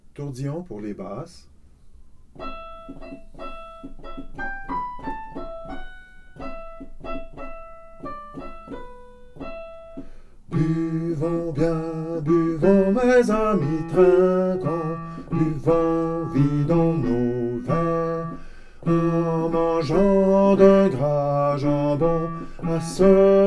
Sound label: tourdion